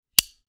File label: Part Assembly; TOOLKIT vol.2